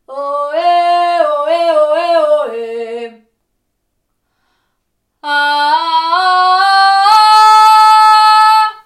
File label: Slime